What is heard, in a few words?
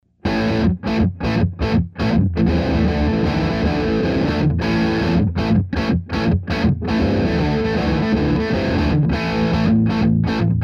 high gain